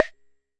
Jomox MBrane, drum machines; Jomox MBrane 11

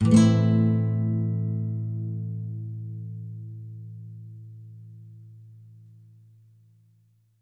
Nylon Chord A min, Guitar; Nylon Guitar Chords

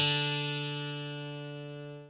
12 STRING D, Twelve String Guitar; multisampled

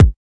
kik

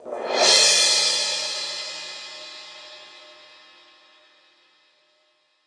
buildup crash, drums single hits

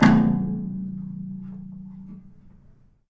fugged up piano hit; Piano and Epiano

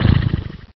Horse Breath

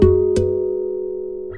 two tones harmonics hit; Weird and Interesting Hits; single hits

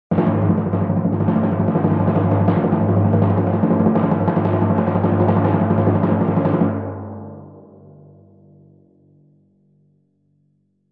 timpani tremelo; Western and Latin Percussion; single hits

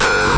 obnoxious kick

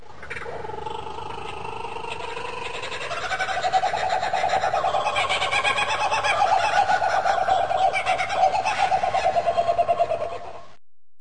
kookbura; Zoo and Nature

